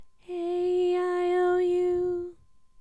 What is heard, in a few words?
aeiou Mid